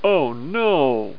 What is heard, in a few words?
lose; PBALL95; 501 Great Games - Volume One; Guildhall Leisure Services